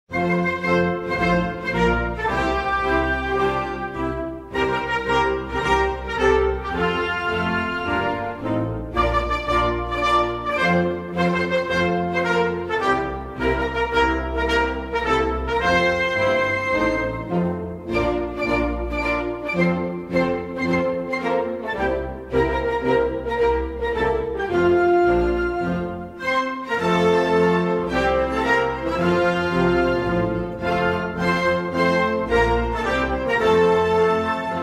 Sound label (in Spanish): Himno Nacional de Comoras